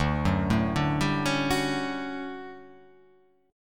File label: D Major 11th D